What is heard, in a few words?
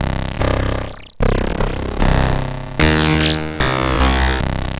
Lobster Bass, Bass Foundations; Sonic Foundry (Sony Creative Software); The Electronic Music Manuscript Collection